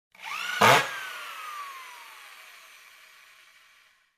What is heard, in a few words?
Electric Hand Driller Quick Drill